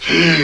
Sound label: gurp; tigger